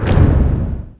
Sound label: gate hit